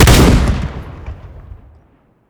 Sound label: sawed off; arccw go